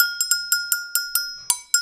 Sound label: AGOGO LP.140